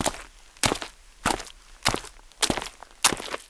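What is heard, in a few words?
footsteps; Mafie 1 cz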